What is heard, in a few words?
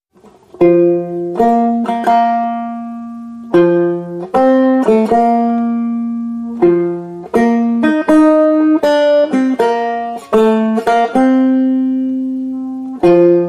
Bridal Chorus, No Organ; Trad (PD)